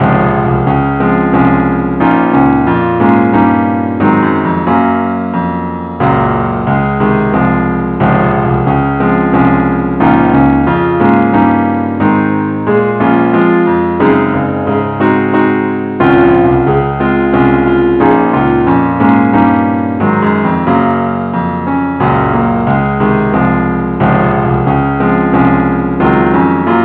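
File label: keyboard only key D flat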